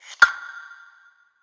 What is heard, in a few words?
perc; eddyflux